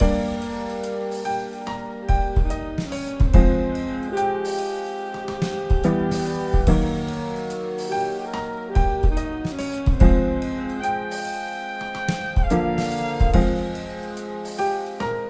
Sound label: Speakers - Drums; Pools